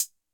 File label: Close hat